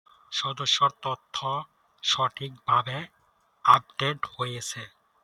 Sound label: success